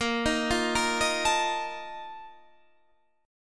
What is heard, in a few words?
A sharp Major 7th Suspended 4th, down, Guitar, Standard; arpeggiated